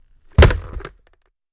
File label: Football Helmet Pla, football